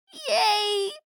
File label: yaay; slash